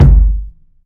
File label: long room reverb bass drum one shot g key, bass drum single shot